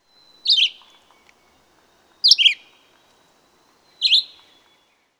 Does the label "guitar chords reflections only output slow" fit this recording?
No